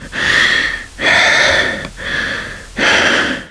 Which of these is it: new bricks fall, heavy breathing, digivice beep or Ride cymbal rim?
heavy breathing